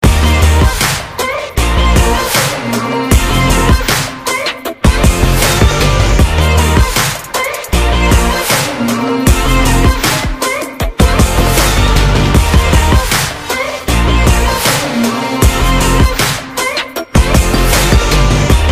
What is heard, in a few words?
Nervous Energy Instrumental